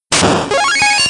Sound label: hit jingle; Parappa The Rapper Stage 6 Jingle